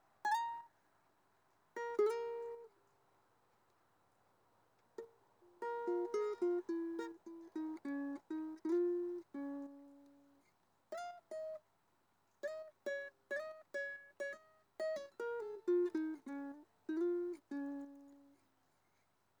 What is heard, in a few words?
Solo Attempt, ukulele